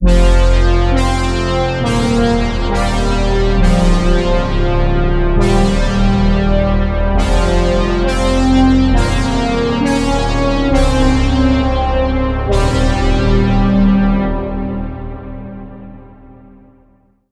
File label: Lead, Spire; Gritty Aura; Routed Sound; Spire Expansion; Reality Maker Vol.1